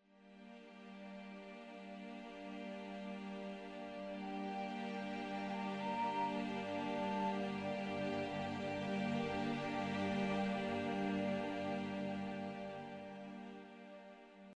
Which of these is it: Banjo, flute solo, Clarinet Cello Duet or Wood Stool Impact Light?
flute solo